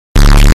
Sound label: BASE BOOSTED SOUND